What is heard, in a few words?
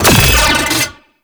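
Drone Orbital Strike Projectile Fire; Warframe; Corpus; Corpus Lich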